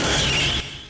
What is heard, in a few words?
Eye Fall; Macworld (1997-11); Games World; Shareware Games; Arcade; Peng! 1.0